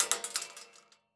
Weapon Bullet Shell 12 Gauge Shells Multiple Drop On Metal Surface; AdobeCC